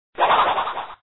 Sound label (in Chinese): 飞标; Samurai vs Ninjas